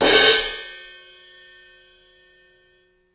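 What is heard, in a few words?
c suspended cymbal crash with quick choke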